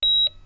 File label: radar